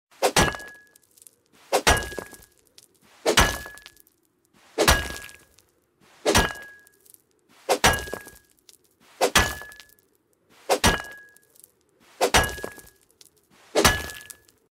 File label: mattock